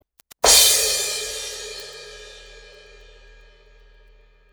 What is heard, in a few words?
crash 16 hit, cymbal; sabian; aa sabor